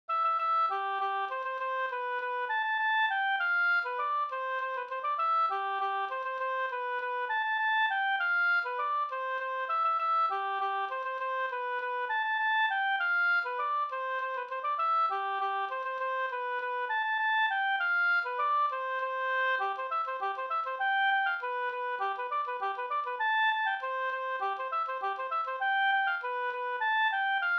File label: polka, polkett